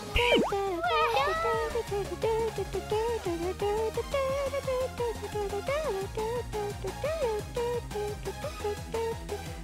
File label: Eurobeat; IRyS